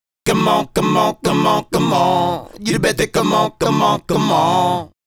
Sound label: Male Group, Hip-Hop and RnB Vocals